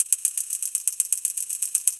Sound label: Cymbal; Trance; Drums; Sonic Foundry (Sony Creative Software); Trance NRG VOL-2